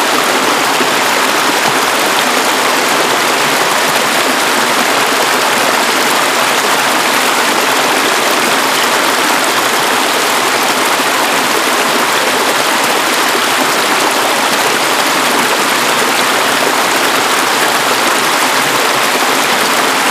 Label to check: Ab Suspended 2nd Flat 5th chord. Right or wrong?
Wrong